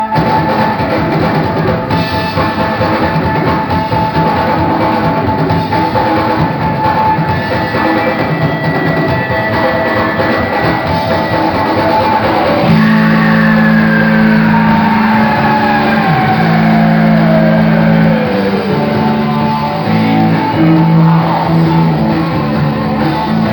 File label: Drum Solo; Live At The Odeon; Newcastle; October 30, 1971